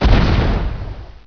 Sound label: line clear; kids-tetris